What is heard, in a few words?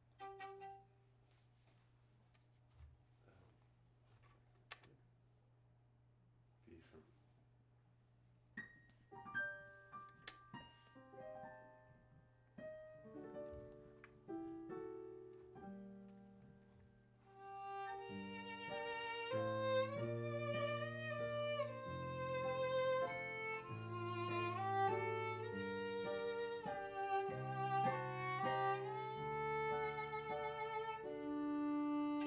Flute